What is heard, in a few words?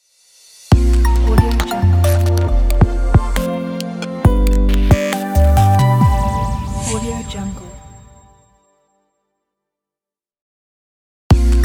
Corporate Stylish Logo